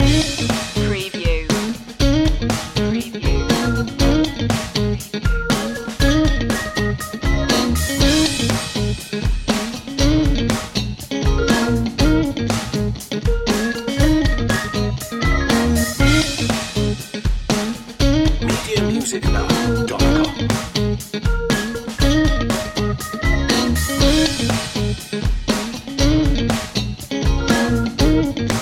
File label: funky guitar and tonewheel